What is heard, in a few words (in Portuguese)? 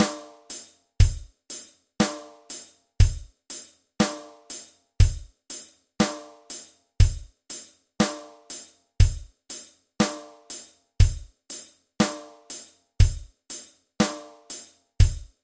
Baterna Punk Rock treino; Curso Baterna; Aula 1